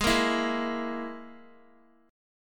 G sharp Suspended 2nd Sharp 5th, Guitar; G#sus2#5; strummed; JGuitar

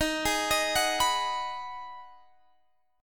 Ab Minor 7th

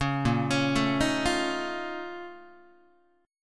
A sharp Minor Major 11th, Guitar; arpeggiated

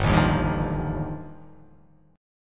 G 7th Suspended 2nd Sharp 5th G chord

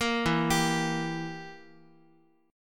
E Diminished E, Guitar; Edim arpeggiated; JGuitar

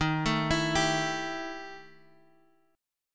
E Minor Major 7th Double Flat 5th, Eb

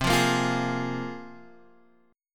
A sharp 7th Suspended 2nd, chord

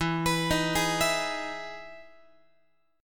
E Major 7th E down Guitar; EM7 arpeggiated

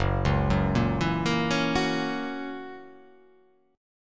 F sharp 7th, guitar

